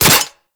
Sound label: Wrist Gun Mech; Warframe; Tenno; Incarnon